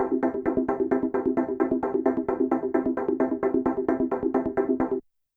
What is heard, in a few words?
Trance, YAMAHA RM1X